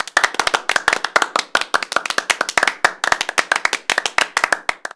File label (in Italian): applauso, applausi, persone